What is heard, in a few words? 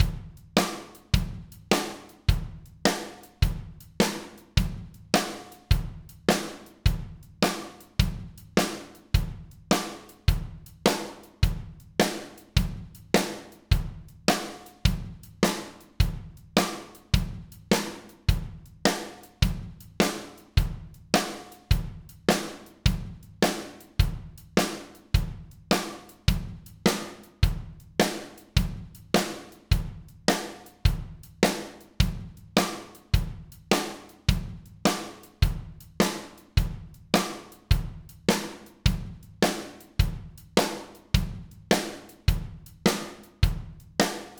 country guitar double stop; backing tracks